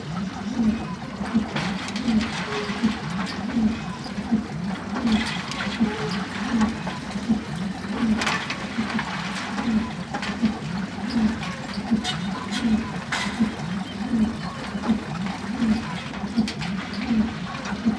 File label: Windmill Rotate Slow Loop; Oculus